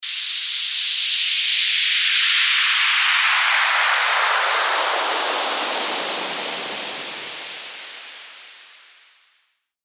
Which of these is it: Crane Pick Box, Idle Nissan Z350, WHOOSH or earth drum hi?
WHOOSH